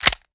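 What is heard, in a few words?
pistol reload fast mag in; gmod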